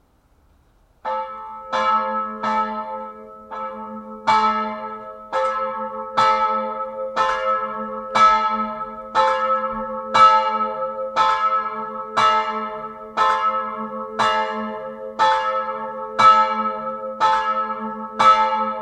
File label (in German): glocke